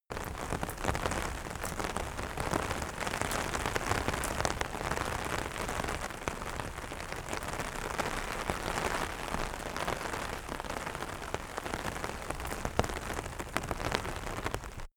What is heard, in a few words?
rain on umbrella